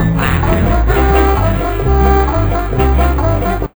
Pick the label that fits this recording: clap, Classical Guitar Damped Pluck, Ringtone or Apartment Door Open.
Ringtone